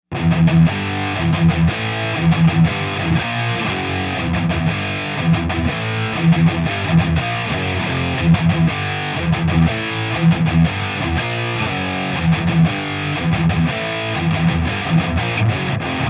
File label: vh4 treb 2